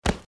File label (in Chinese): 马撞击到物体